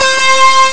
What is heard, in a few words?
ex B Ack Guitar; Amiga Soundtracker Sample Packs